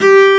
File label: CELLO G4